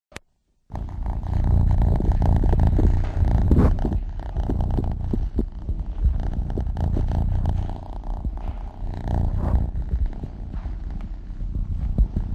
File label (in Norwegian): Katten Maling